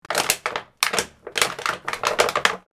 Crushing a plastic bottle before throwing it in the trash